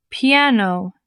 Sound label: piano